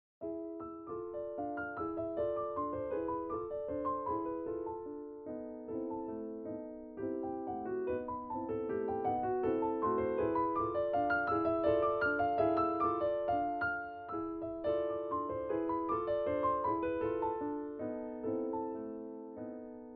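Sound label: vintage high, piano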